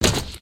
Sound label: tin, human step